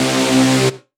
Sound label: Synth, DnB One Shots